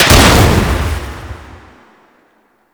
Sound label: Hyena Fire Grenade Launcher Shot, Enemies; Warframe